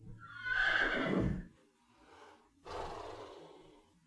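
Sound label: 22 Whinney Bluster, horses; model railroads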